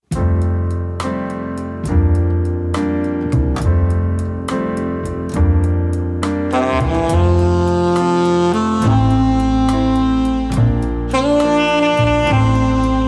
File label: Dinner Music